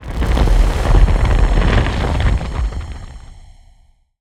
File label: texture synth; scary; Bluezone Corporation